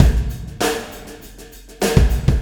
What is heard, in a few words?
Wormhole Beat, Processed Drumkits